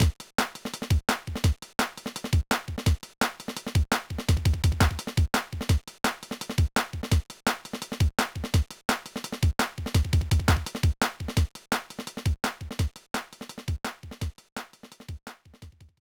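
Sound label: background music; No No No Puzzle Chailien; GBA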